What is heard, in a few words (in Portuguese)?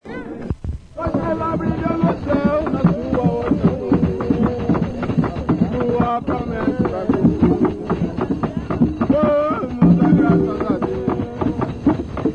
batuque